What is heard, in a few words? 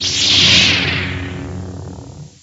Saber On; WT588D; Arduino; Voice Memory Keyer; SabrSound2